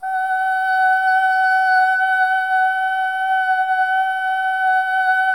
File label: solo boy; Spectrasonics; Symphony of Voices